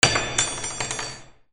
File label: FALLING